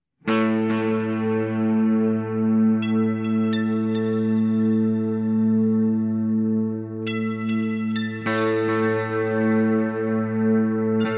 old frame guit

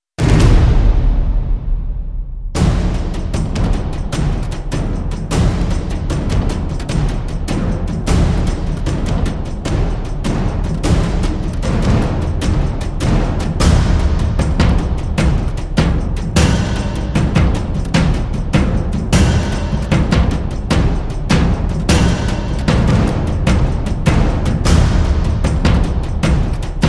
heavy percu

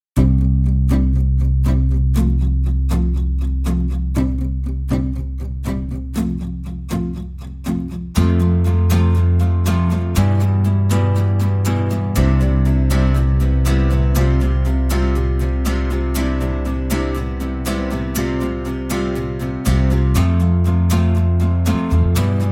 guitars

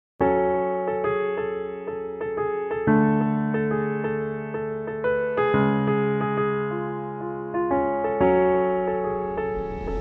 Smooth Piano

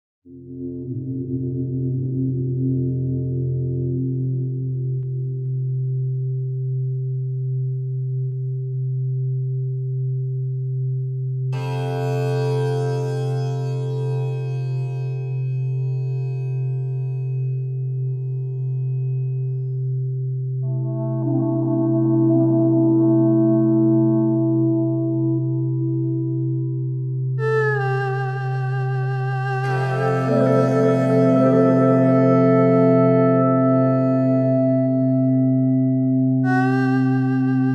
ambiance

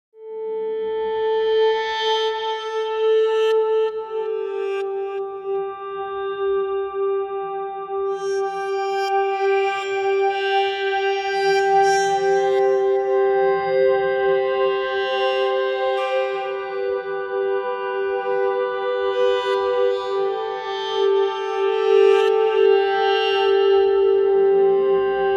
evolves ambiance

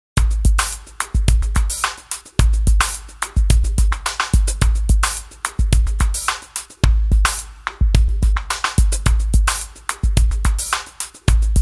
drums

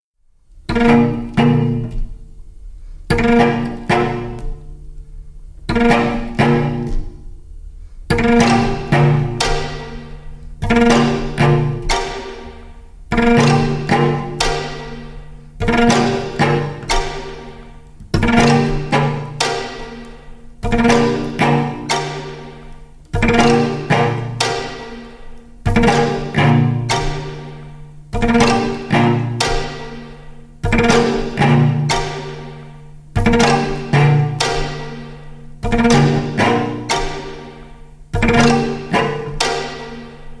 string

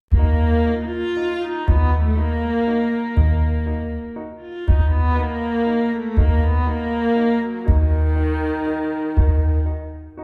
viola

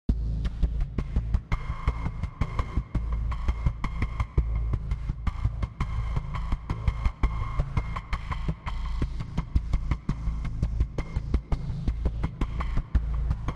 desert texas drums

